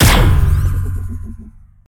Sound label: plasma cannon; battle